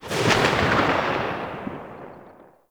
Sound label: Uzi Tail; Warframe; Tenno; Prime Tenno Uzi; Weapons